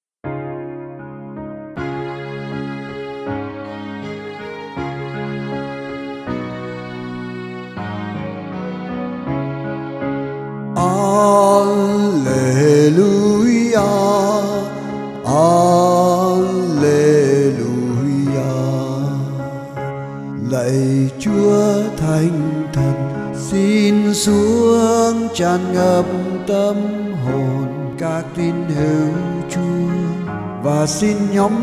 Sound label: alleluia song